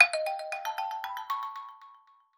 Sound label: Daffodil, ringtones